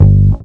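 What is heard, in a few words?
electric bass; SoundFactory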